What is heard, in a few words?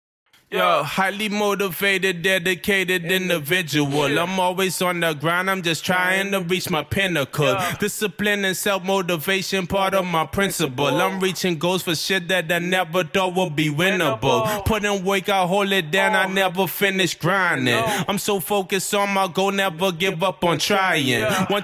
HH 140 Bm, acapella